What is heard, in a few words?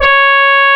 C#, Synclav FM, BRASS; Big Fish Audio